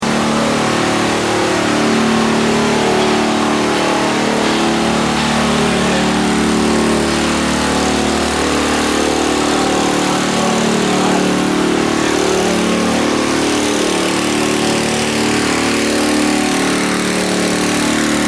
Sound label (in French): moteur; may